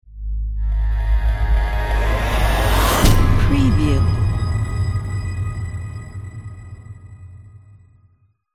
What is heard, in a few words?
space logo